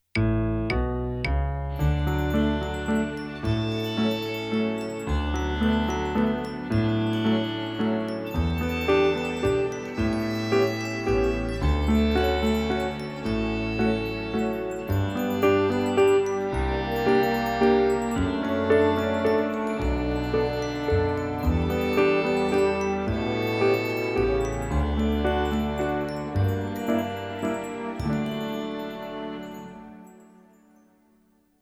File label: Musette, violín